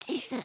generic build

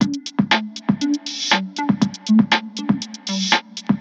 Boombox Beat

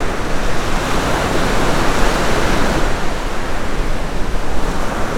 ocean loop

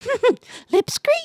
sway, emotes

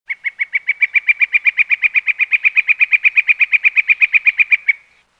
Jungle Bird; gnarled forest

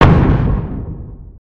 Siege Tower Distant; destructibles

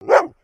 doberman bark

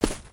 Pet Warthog Dig; creatures pets

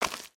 Foot Mud Walk Light, footsteps

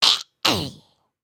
Die; Lego Strombie; creatures organic

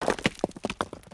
Large Pet Digs; creatures pets

